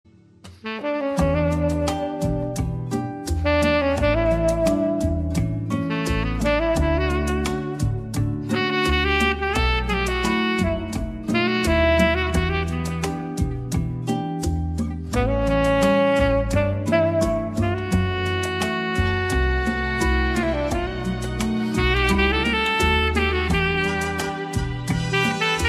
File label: Saxophone